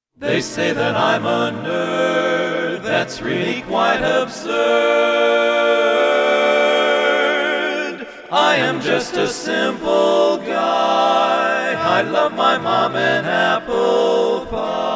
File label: Major Chords; men